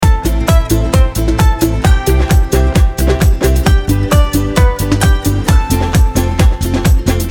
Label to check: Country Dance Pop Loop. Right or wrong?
Right